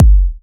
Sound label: TRAP KICK